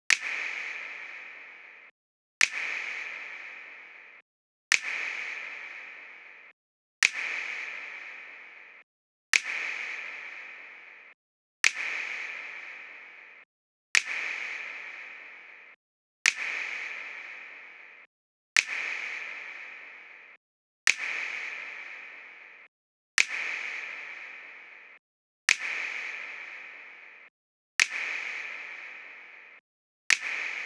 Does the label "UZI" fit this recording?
No